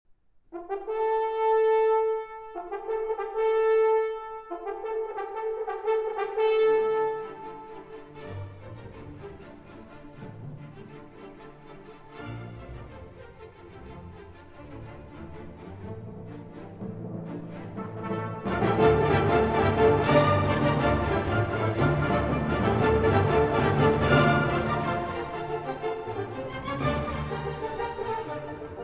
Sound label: Symphony in D Minor, Scherzo. Allegro Con Fuoco; Symphony No. 2; Elegiac